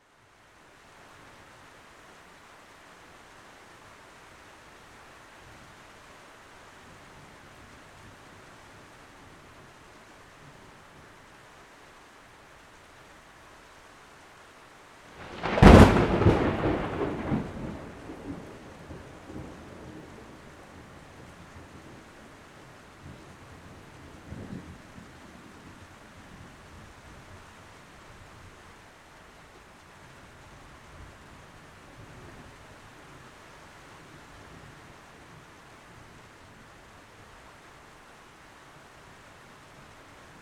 nature thunder strike very close light rain

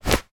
foley denim jeans pull up adjust; zapsplat

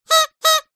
leisure party whistle squawker short bursts; zapsplat

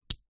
office staples stick snap break

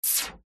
magic wand zap spell; zapsplat

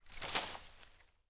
impact tree branch small land on forest floor

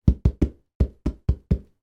hand tap on interior plasterboard wall; foley

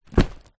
bag ruck sack down on ground

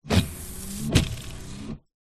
car windshield wiper single wipe external; transport

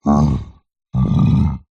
horror yeti monster grunt calm loving; zapsplat

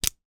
office pen biro plastic lid remove

